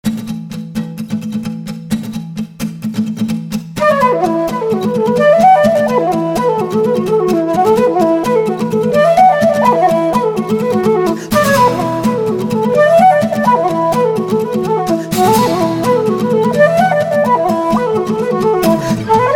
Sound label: Kerry Donegal reels